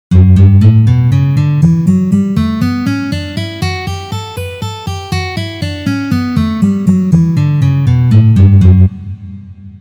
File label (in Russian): Gamma Re mazhor Tri noty 2 pozitsiya